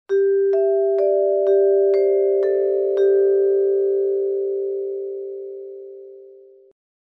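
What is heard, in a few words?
fairy-tale accent vibraphone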